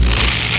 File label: hit, weapons; Quake